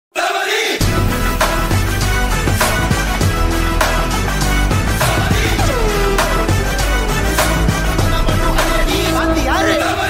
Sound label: title card ringtone; master